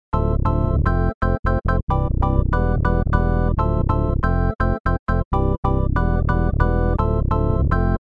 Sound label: organ